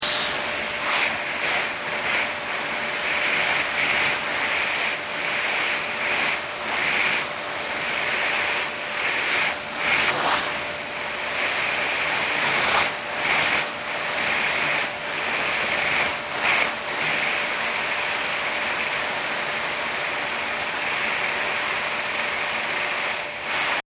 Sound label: Vacuum Cleaner Unknown Operating Alternating